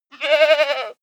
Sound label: goat baa stutter male